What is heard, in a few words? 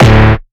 phonk cowbell D; basis; phonk toolkit; rocket powered sound